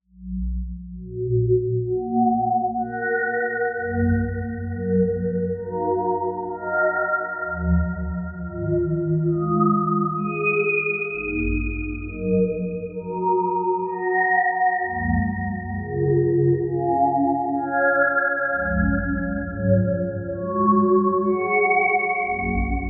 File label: polystairs